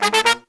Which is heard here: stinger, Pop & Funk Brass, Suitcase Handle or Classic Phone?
Pop & Funk Brass